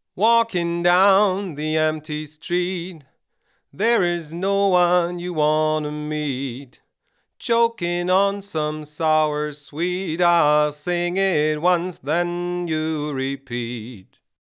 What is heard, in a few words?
SSL X-Logic Alpha Channel Vocal EQ warm Limiter Medium